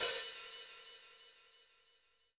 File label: big cymbal sound g sharp key, tuned cymbal